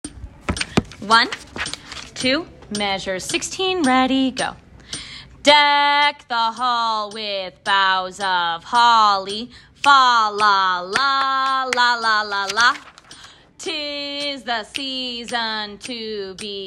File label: rhythm bass; deck the hall